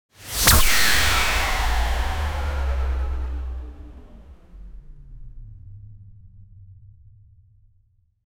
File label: single shot scifi weapon